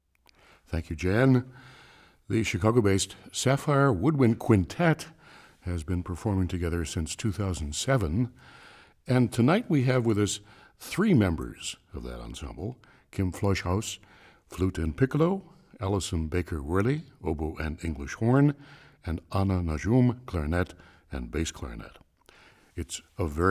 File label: Woodwind Trio; Live From WFMT